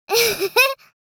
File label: Young girl giggles